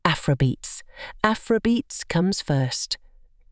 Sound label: Jingle; Hafrikplay